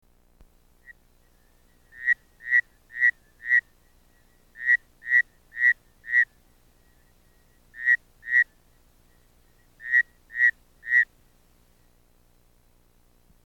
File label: Bushveld rain frog